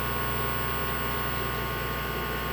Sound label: COMP, OFFICE; AKAI S6000 CD-ROM - Volume 6; Tools